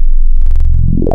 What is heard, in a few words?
303 Acid